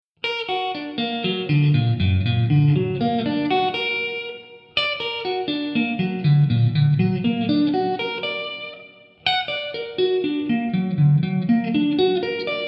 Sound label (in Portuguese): Montagem de Acordes Arpejo Triade Aumentada